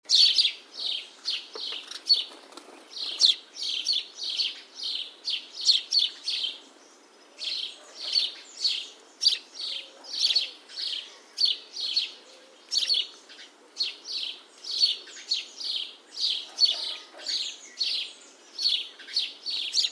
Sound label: Passer domesticus; SETE